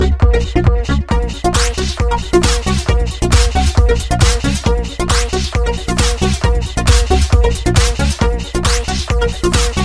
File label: best mix music, electronic ringtones